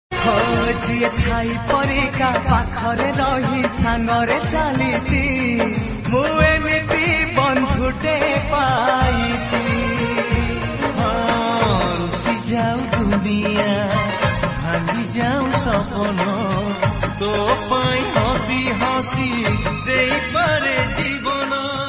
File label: Odia Friendship Song Ringtone